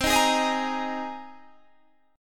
C Minor 7th Sharp 5th C Guitar Half Step Down

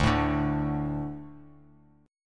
D 5th D, down; D5 strummed; JGuitar

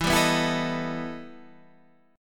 E Minor Major 11th E Guitar Standard; EmM11 strummed; 12,10,9,9,10,11

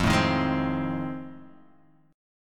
F 7th Sharp 9th; F7#9 strummed

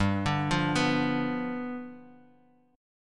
G 7th G, Guitar Open D; 5,5,3,5,x,x; down

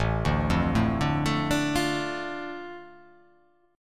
A Suspended 4th Sharp 5th, A

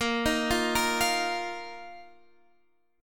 Bb Suspended 4th, Bb, down; arpeggiated

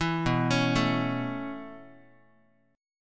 B 9th Suspended 4th B down Guitar; B9sus4 arpeggiated; 4A,4E,5A,5D,5G#,6C#; chord shape; JGuitar

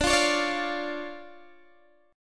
D Minor Major 7th D Guitar DADGAD

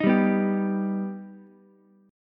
Eb Augmented Eb, Ukulele; Eb+ strummed; 8,7,7,10; JGuitar

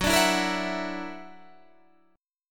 F sharp Augmented 7th

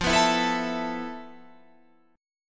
F Major 7th Flat 5th Guitar Open F, chord; strummed

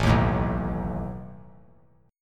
A Suspended 2nd Flat 5th A down; Asus2b5 strummed

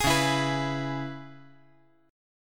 C sharp Minor 7th C sharp, chord; C#m7; strummed; JGuitar

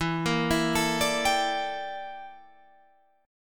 G Minor 6th Add 9th E

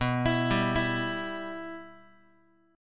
B Suspended 4th Sharp 5th down Guitar; Bsus4#5 arpeggiated; JGuitar

